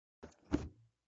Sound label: table; GameplaySequenceDemo